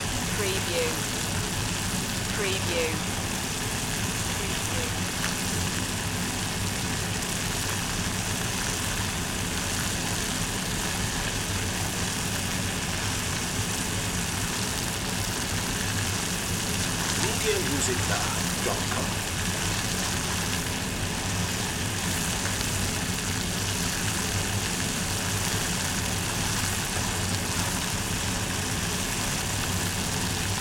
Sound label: boiling water